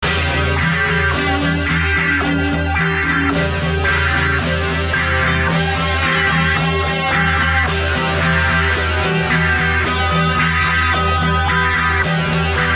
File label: single notes